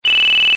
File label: Loud Beep once; LG enV2